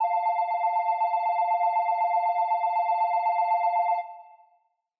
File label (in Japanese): 日本の駅の懐かしい発車ベル